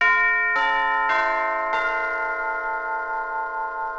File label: bells test